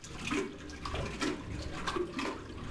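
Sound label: dock water; Splashdown; XBOX; Soultree; Venice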